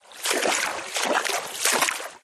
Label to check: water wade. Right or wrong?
Right